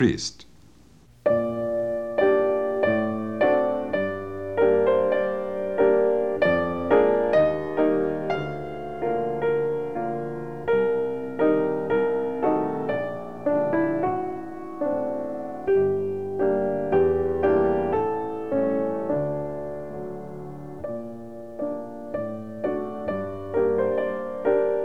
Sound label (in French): Chanson triste; tchaikovsky